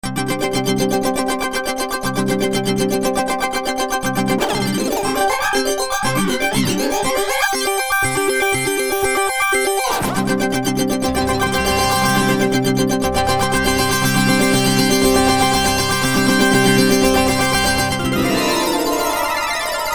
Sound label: nice pattern